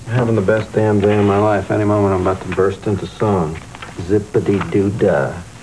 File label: Singing